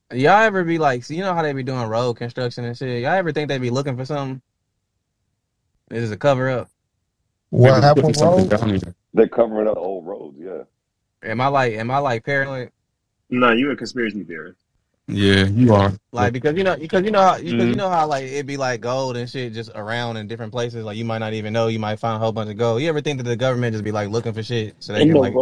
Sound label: Trap